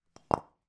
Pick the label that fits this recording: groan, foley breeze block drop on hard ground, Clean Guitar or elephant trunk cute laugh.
foley breeze block drop on hard ground